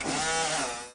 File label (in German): wascher start, volvo 9900; Vehicles; AI volvo 9900; Freyfurt Beta